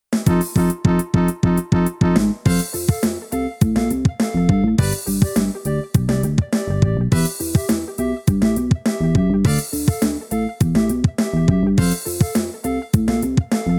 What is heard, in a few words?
Roland E-X10 Style Cool Funk